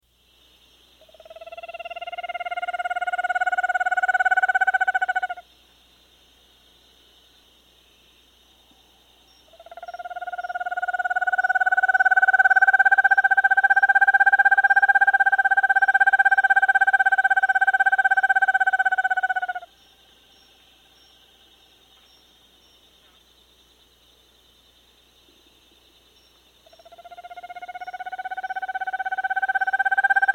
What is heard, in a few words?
Vermiculated Screech-Owl; S. America